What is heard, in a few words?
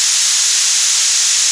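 STEAM MACHINE; forsaken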